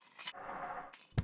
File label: Handling yoyo; Talking Tom Cat 2